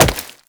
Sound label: Wall Jump Rock; Footsteps; WallRun; Warframe; Characters; Tenno